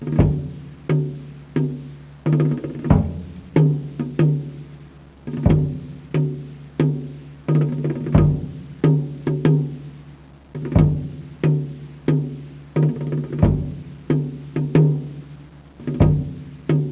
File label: rythm, drums; music